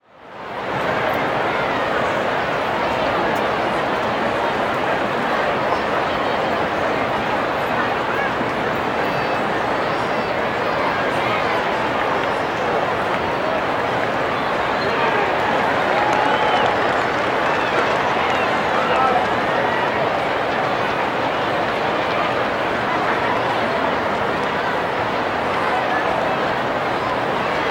Stadium Large Crowd; crowd walla; Sound Ideas; Walla Series

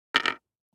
DICE on Hard Wood, Drop, Standard, 5 Five Dice; Samples; ArticulatedSounds; DICE Sfx Party (Extended)

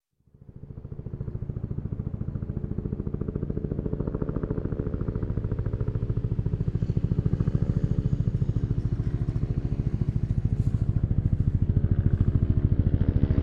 Helicopter Army Approach And Pass; Sound Ideas 2000 Series

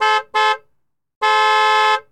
Truck Dodge Ram Horn; Trucks

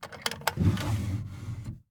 Dresser Drawer Open; Doors & Drawers; 1001 Sound Effects

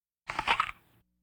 Vocal effects breathing Moaning Baby

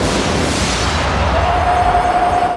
POW; XXL 800 Production Effects

